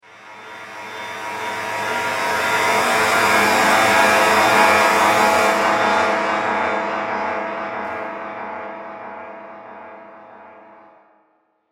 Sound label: Ultimate Horror Wind Up The Tension; Scary Soundscape Sound Effects